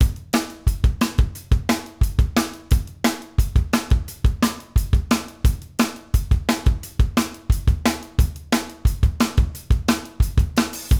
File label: groove